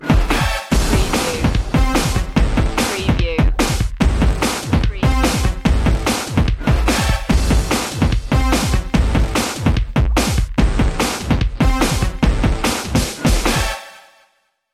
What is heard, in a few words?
goliath funk